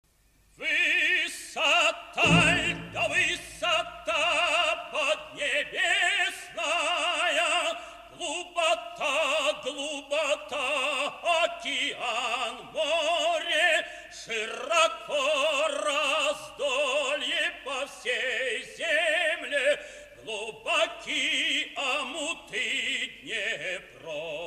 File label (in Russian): хор; Р-К; Садко